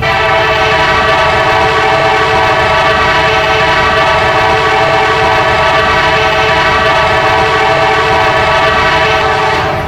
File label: k5lla, horn; run 8 misc horn pack